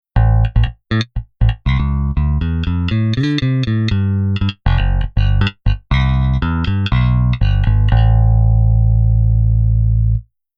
Bass DI

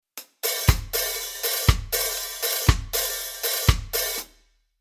Rhythm Reggae

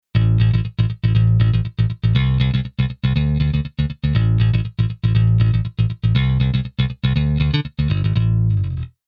Bass Arr Rock Bass

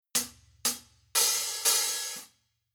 Rock HH, Rhythm

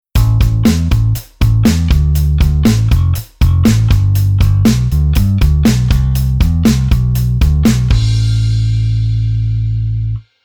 Bass Oct

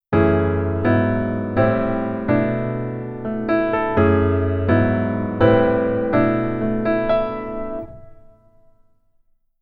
keyboard top note

